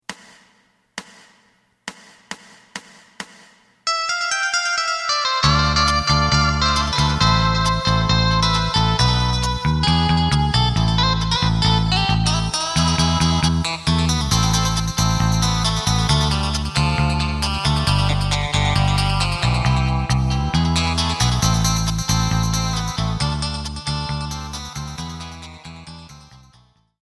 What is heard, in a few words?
drum